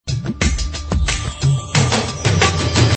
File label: Tabala Tune